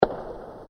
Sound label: Short Dark Firecracker Bang; Fireworks; Fireworks (Sound Effects:Backgrounds); CraftedSignals